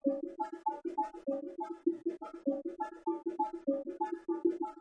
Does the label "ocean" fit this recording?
No